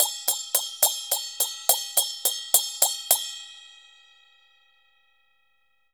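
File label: CHINA SM17 L, China cymbal; Spectrasonics - Heart of Asia CD2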